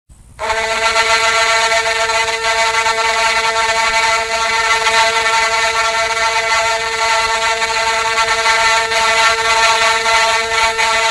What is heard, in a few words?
the annoying vuvuzela sound